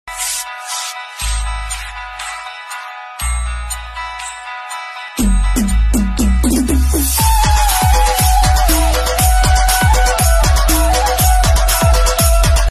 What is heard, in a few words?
navratri ringtone maa durga ringtone bhakti ringtone